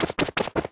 Stairs

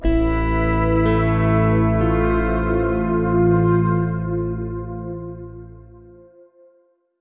Mood Drama; house m.d.; nds

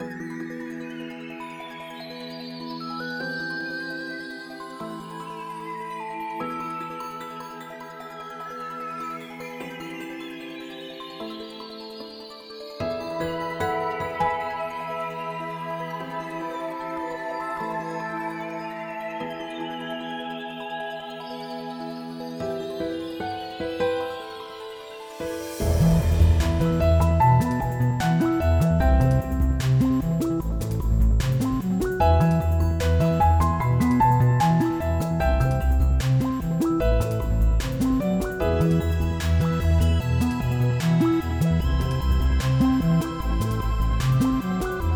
cave active; princess castle quest windows gamerip 2021

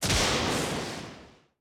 Lightning

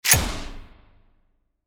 revolver rifle shot with barrel; out of reach treasure royale 2020 gamerip pc

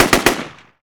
Weapon Machine Gun Hand-Fire

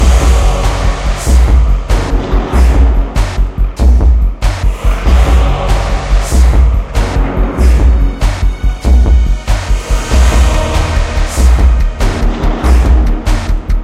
Boss floor loop

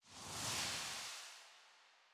dream plant emit orbs

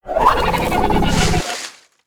Queen Stun In

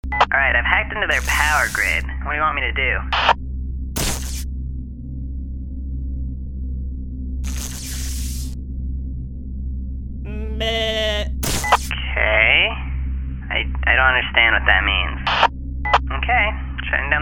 Power Grid; Infiltrating The Airship

